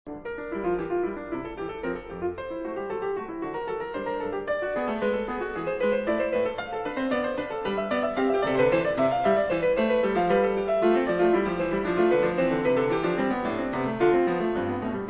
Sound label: Bach Piano Concertos